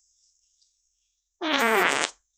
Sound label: squishy wet fart in an awkward silence